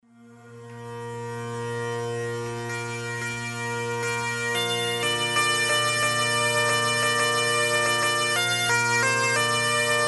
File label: bagpipe